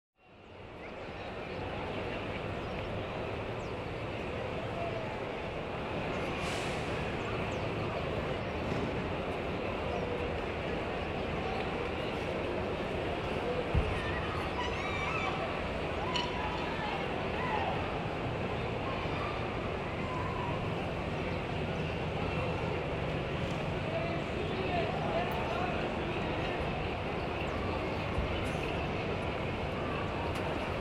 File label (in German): baseball - stadionatmosphäre; Sport